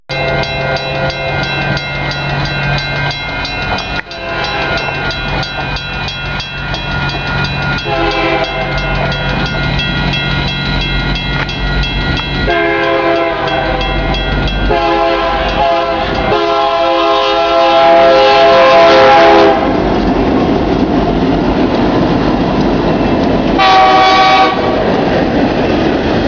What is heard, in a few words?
Mechanical; Arizona; Tucson